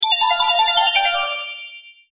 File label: hint wiggle; Nickelodeon - Back to School Search